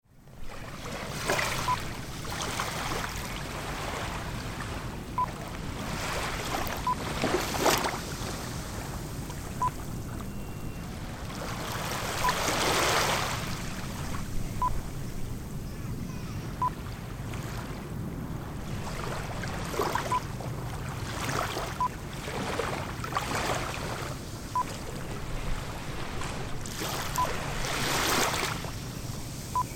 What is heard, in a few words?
Sanur Beach Waves Lapping